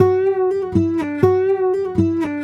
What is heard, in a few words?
Sarangi, Acoustic String; Sonic Foundry (Sony Creative Software); Friendly Fire